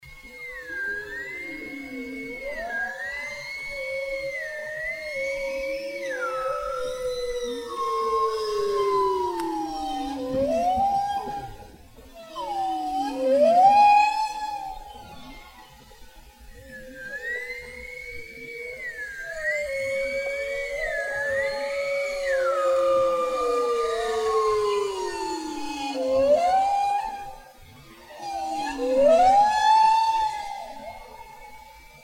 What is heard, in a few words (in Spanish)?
canto ballena